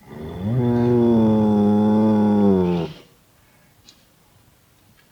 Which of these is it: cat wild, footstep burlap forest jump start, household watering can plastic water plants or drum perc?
cat wild